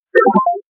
Email Alert

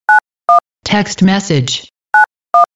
Modern Txt Msg

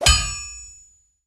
Hammer

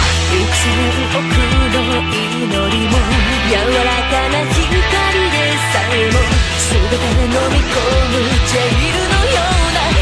Orbital Beat

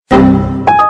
Windows Error

